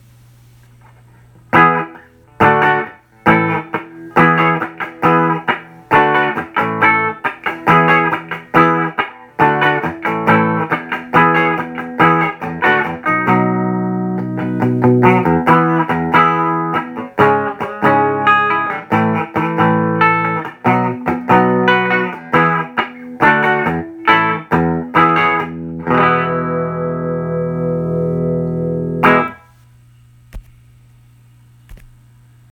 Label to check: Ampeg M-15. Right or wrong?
Right